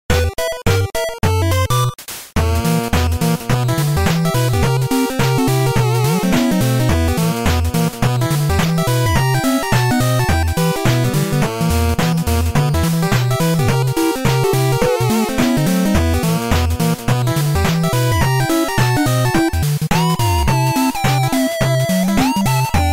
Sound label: bowser 8 bit